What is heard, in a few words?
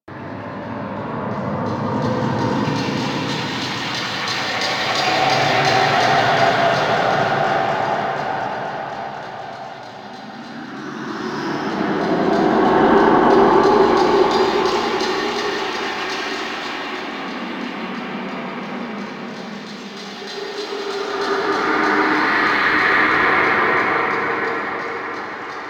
Mountain Pass Maw Foreshadow Loop; Warframe; Ambience; The War Within